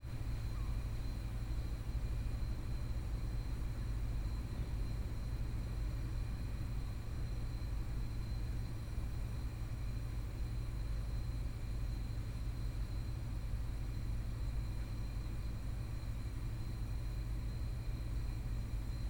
AMB SUBURBAN Heating Station Ventilation System Less Traffic; Systematic-Sound; General Ambience Series – Suburban 01